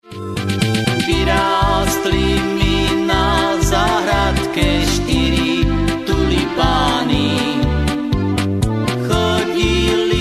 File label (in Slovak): akord